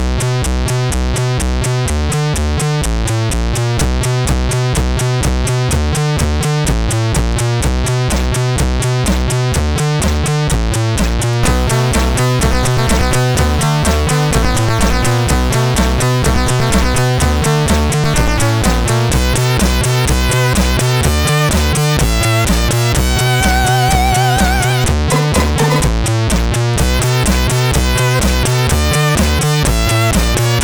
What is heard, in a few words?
Mummy intro, sid CSG8580R5